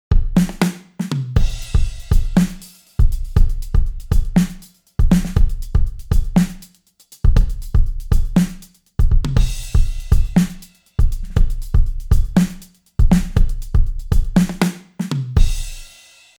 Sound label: Drumloop Dry; RND Portico 5043